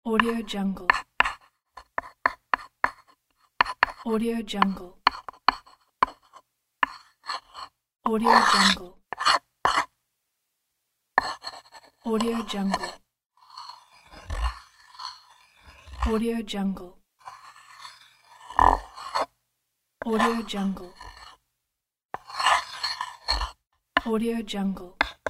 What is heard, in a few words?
chalk